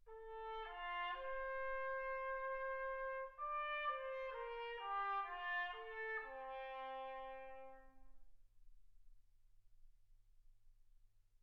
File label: trumpet